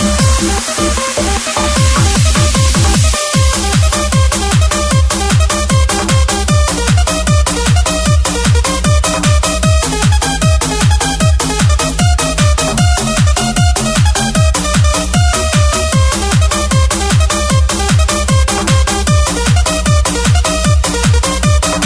Dance Scouse

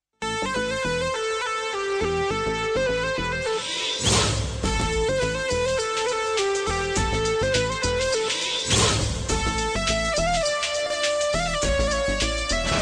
Marriage Band

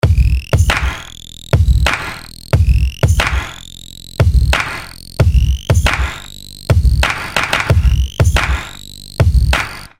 Big Krump Beat

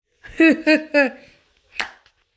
Mouth pop

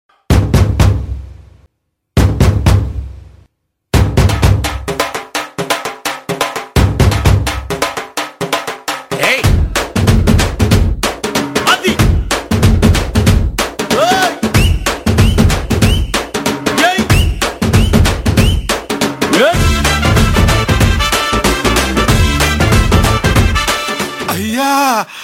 Mix Music

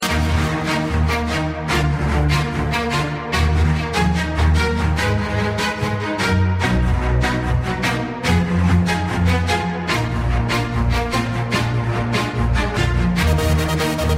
Symphonic Tune

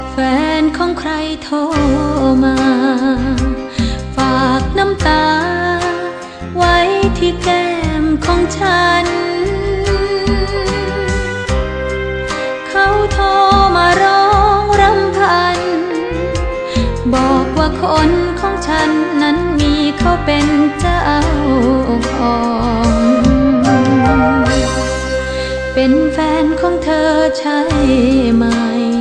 Thai Love Song

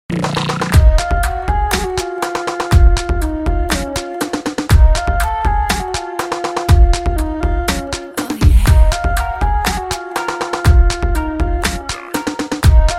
Chords

